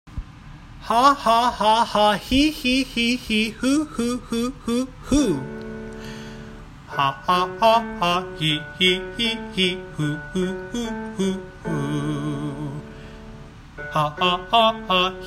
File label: Ha He Hu with Vocals, vocal warmups